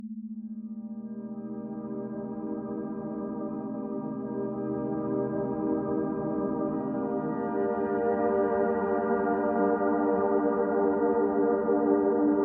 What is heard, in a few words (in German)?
BB 15Hz mit Ambient Sound, binaural; Beta; Klarheit